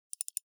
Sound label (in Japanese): ダブルクリック音